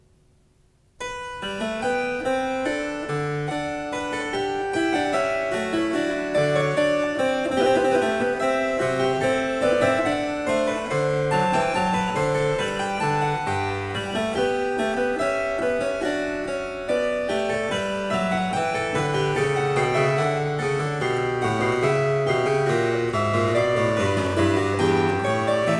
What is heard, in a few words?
Cembalo; Variatio 18 Canone alla Sesta a 1 Clav; Bach; Goldberg Variations